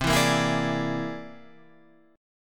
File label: C Augmented 9th